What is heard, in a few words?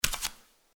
Footsteps Barefoot One